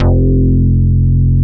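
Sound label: POCKET BASS F2; POCKET BS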